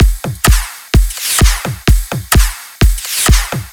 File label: drum loop 128 Full; Anthemic